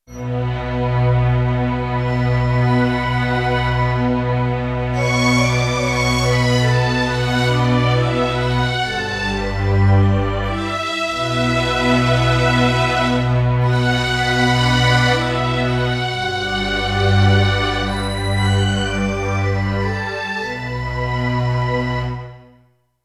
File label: Freezer, Roland D-70; Factory Performances